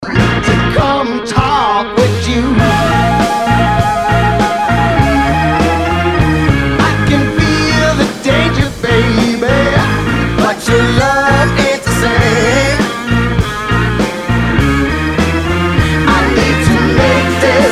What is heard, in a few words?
Rock Medley